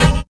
Warsaw Hit, kit; Sonic Foundry (Sony Creative Software); Esoterik Beatz

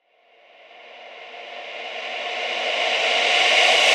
Reverse Vocals A#; Vengeance - Essential Clubsounds Vol.4; Effects

